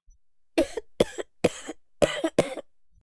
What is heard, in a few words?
A woman coughing